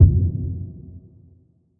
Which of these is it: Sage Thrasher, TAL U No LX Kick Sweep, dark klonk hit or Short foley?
dark klonk hit